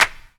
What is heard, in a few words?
CLAP, Dance Drums